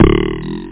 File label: Boing